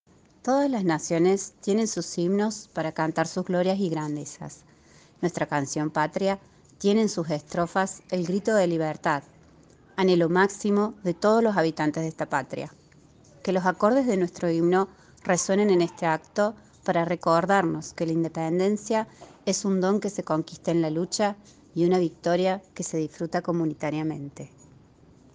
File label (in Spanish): himno